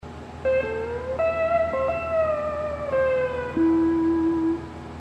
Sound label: hawaiian piece